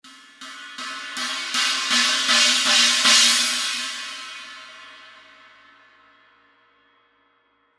18 a zildjian china low

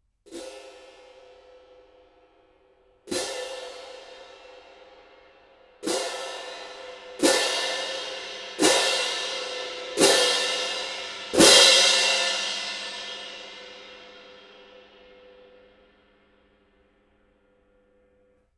18in a zildjian viennese symphonic

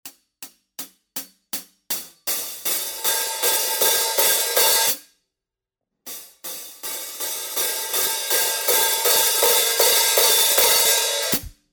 hits closed to open, hh audio

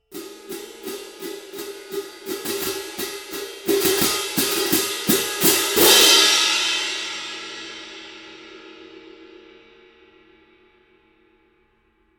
19in zildjian stadium medium heavy

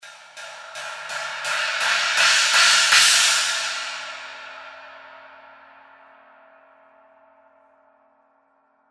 21 zildjian ultra hammered china brilliant

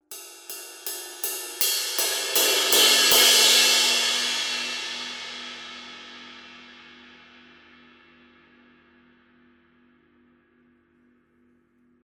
zildjian sweet ride crescendo hits

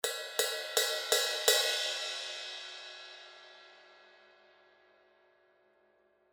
18 zildjian fast crash bell hits

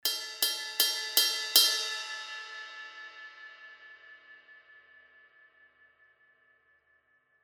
zildjian heavy crash bell hits

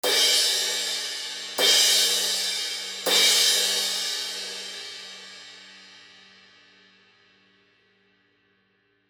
19 zildjian medium thin crash hits

